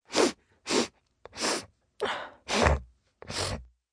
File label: Sniffle Snort, Sniffle, female, long with snorting, cold, sick, (human voice); Sniffling; VoiceBox